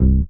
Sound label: DM BAS, Dance Mega Synth